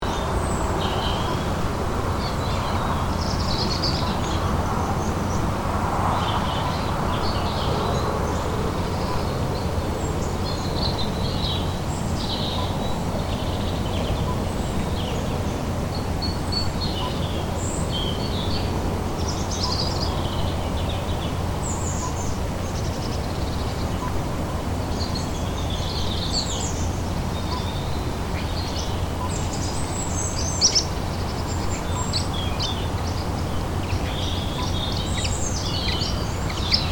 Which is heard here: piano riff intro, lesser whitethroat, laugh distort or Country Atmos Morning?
Country Atmos Morning